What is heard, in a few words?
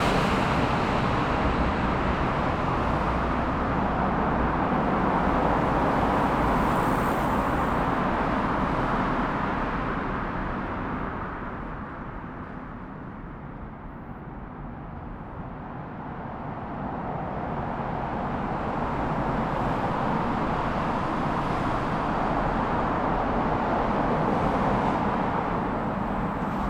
CAR XY